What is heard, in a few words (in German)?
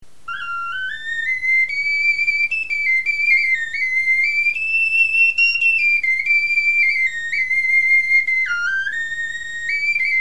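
pent, Knochenflöten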